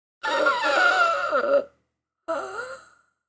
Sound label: female wheeze; Barotrauma; Real Sonar